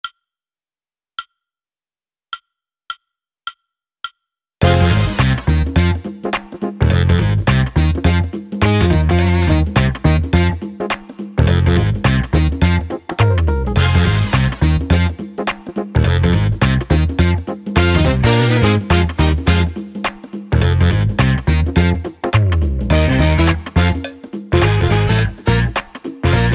Dont Funk With Me drumless

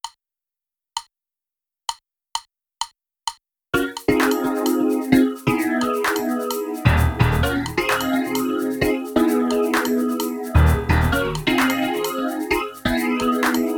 Fusion drumless

